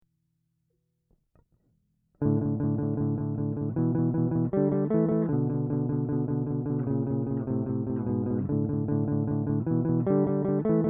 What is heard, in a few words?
harmony 4th 3rd, whammy